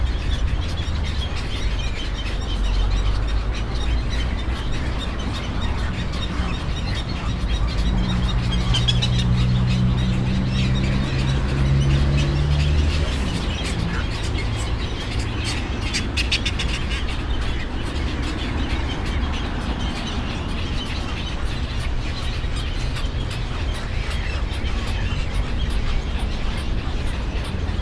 pásztorgém, Arrecife; lanzarote 2017; allathangok

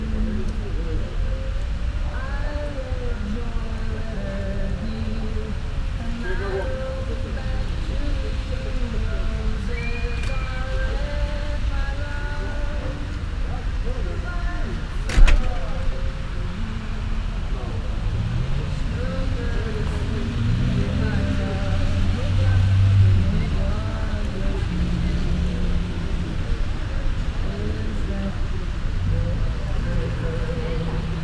gyorsetkezde latyak

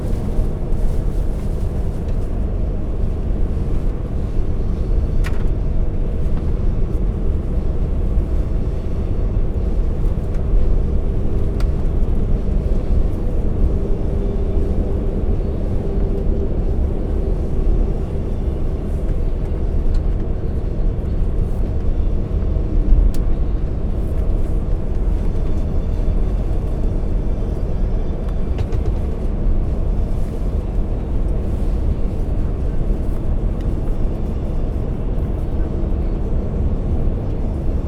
menet közben, mercedes, busz; olaszorszag velence 2014

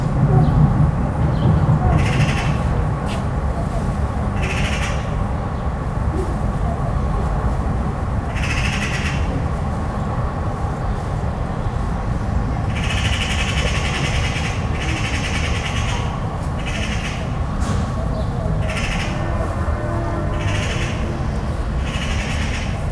városban csörög, szarka; termeszetben; allathangok

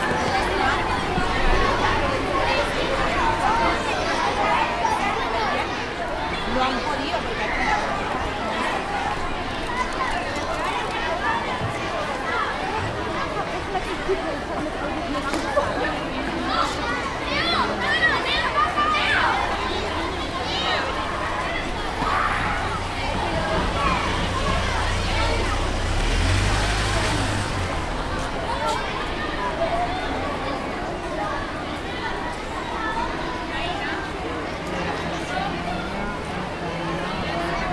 iskolaudvar lakonegyed

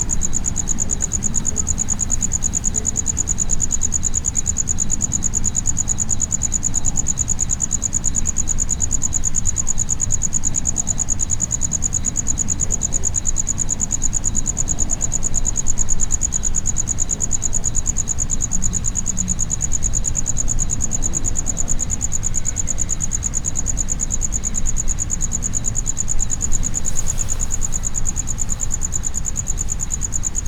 műanyag elosztódobozban viszonylag tiszta, tücsök; jaszberenyi zoo 2016

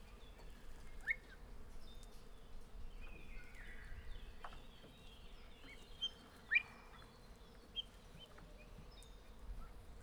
pruntyognek, fácánrikoltás, tollazatát rezegtető páva, aranyfácán; felsolajos zoo 2015; cardioid

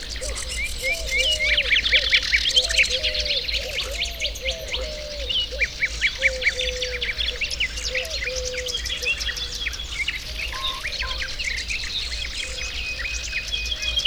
természet, madarak; belso vegallomas; hortobagyi halastavak

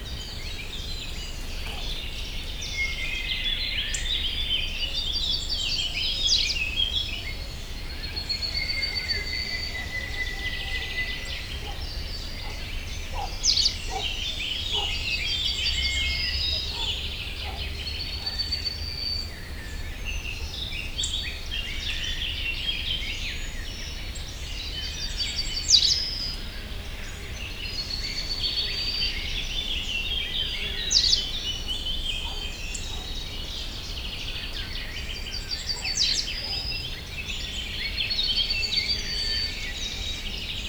távolban egy ugató őz, víz ház; európai őz; cardioid; termeszetben

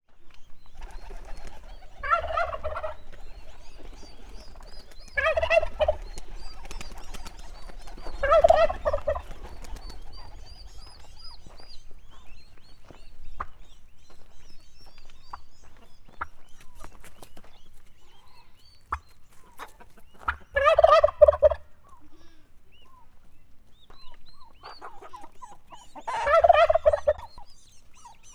baromfiudvar galambokkal; állathangok; miskolci zoo 2009; paraszt udvar

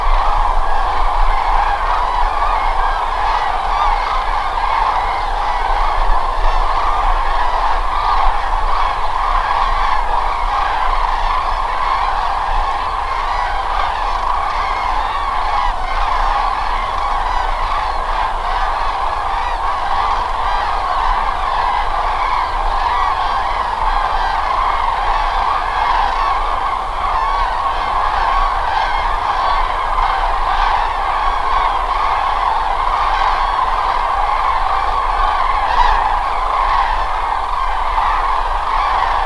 daru; termeszetben

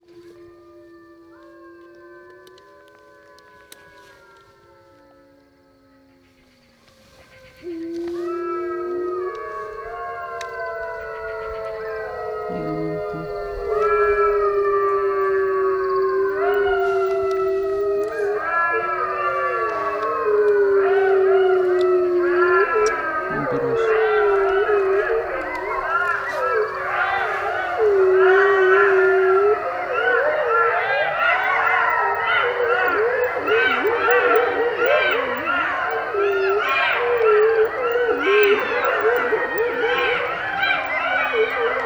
farkasok kezdenek aranysakálok bekapcsolódnak technikai zajok; Hortobágyi Nemzeti Park 2013

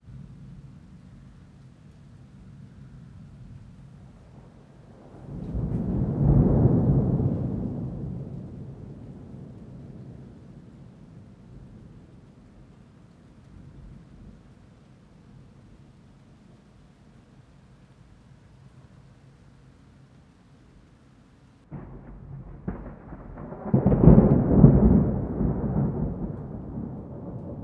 intenziv, majus vege, mennydorges

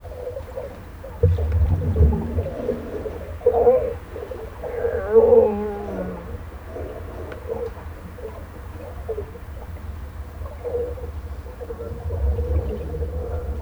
kaliforniai oroszlanfoka uvegfal mogul szelvedo; állathangok; nyíregyházi zoo 2010

